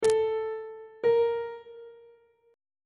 g double sharp 1st interval pitches on treble clef